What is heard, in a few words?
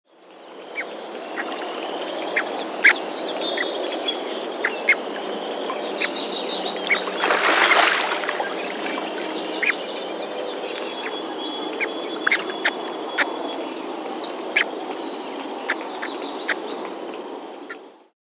White winged Scoter